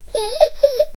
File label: giggling baby laugh light